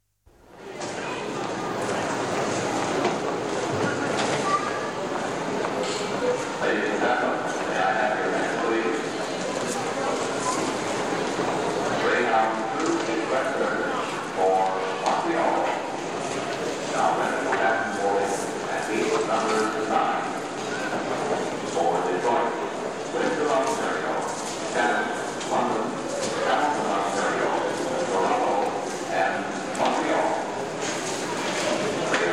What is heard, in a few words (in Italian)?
FOLLA STAZIONE TRENI AEROPORTO